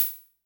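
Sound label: Hi-Hat Open Synth H; YAMAHA RM1X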